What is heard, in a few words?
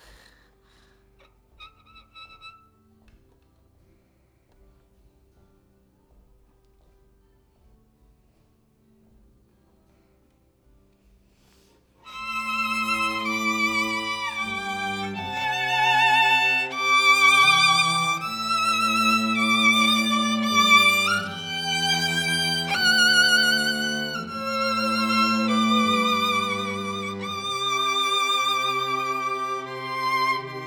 Violin